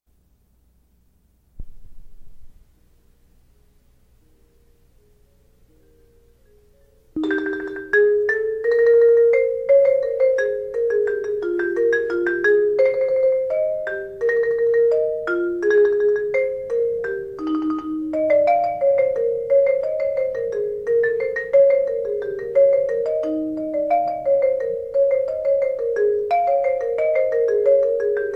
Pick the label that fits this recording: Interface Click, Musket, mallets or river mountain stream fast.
mallets